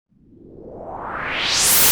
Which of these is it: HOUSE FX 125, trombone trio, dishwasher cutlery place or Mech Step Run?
HOUSE FX 125